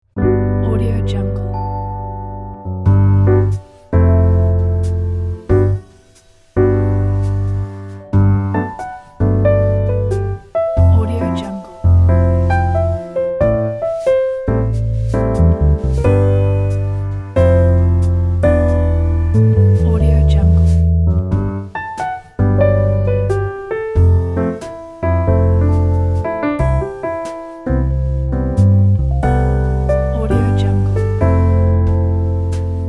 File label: elegant jazz piano